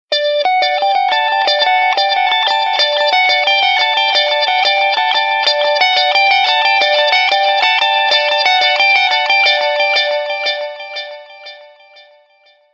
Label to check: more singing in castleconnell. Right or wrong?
Wrong